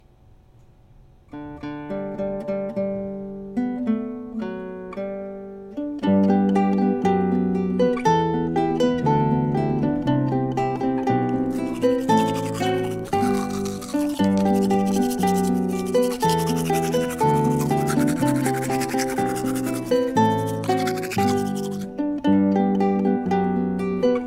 je tanden zonder zang

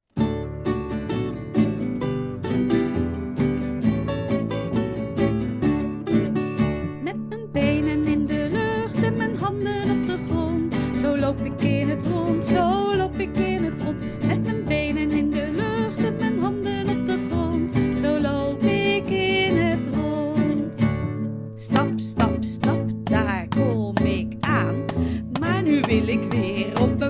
kruiwagen met zang

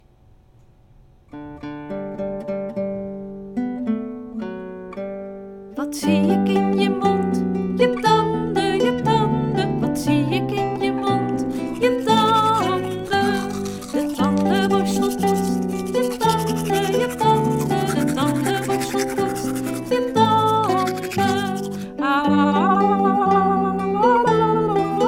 je tanden met zang

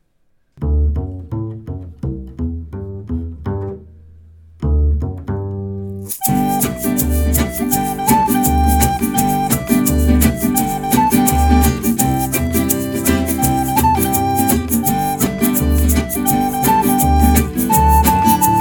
zonder zang met blokfluit

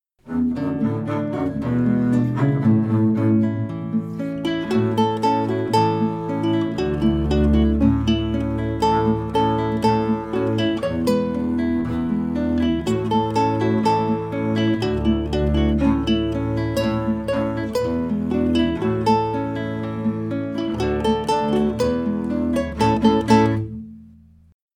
kabouterwas zonder zang

